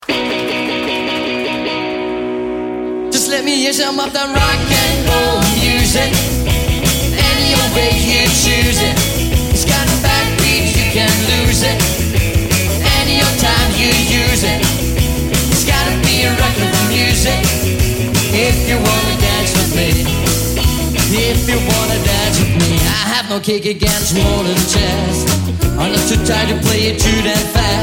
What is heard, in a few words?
Rocknroll music